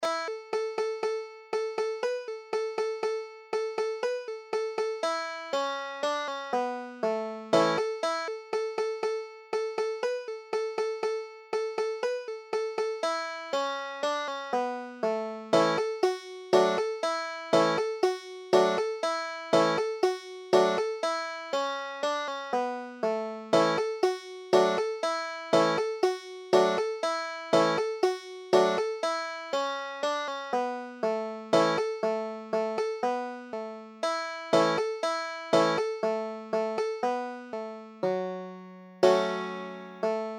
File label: boatman, banjo